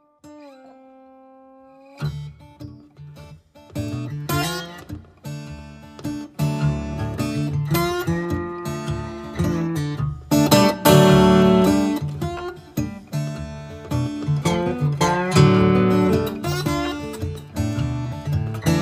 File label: walking blues